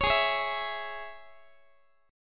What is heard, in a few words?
Ab Suspended 4th Sharp 5th Guitar; strummed; Absus4#5